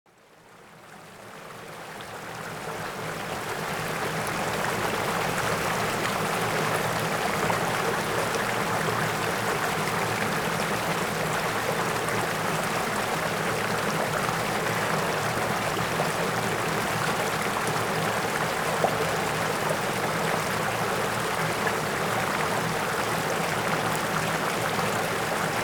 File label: Forest River Rushing Water Stream; Cinematic Sound Design; Focused Ambiences